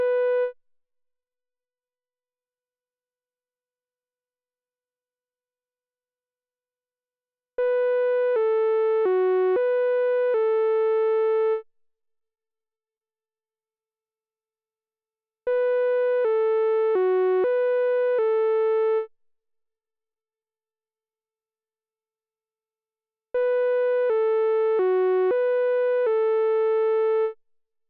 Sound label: MOOG